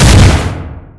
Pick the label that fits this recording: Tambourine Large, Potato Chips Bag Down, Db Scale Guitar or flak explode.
flak explode